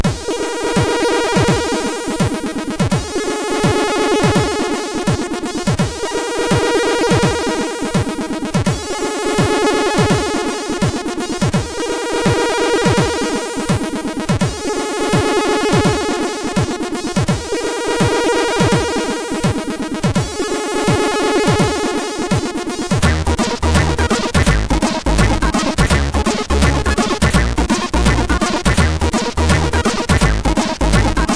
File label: Funky Medina, sid MOS6581R3; hvsc